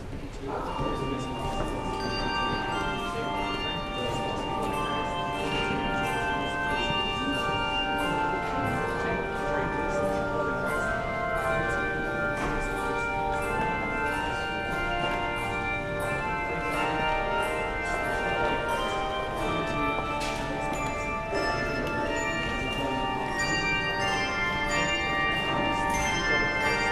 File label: Handbells; What Wondrous Love Is This